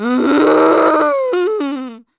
zombie rand; Project Zomboid

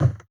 Wood Foot; Zomboid 41.78.16; Project Zomboid

